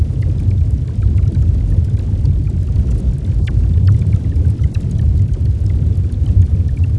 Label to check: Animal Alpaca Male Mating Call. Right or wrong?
Wrong